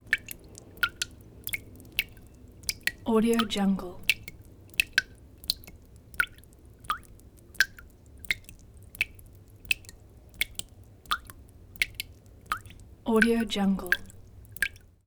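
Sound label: water drip water filled bucket close up constant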